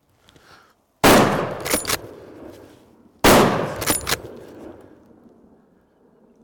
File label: Fire Weapons Sound Effects blaser r8 close shots clipped